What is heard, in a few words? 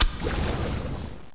player stim Shot